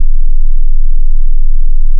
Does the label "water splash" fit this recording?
No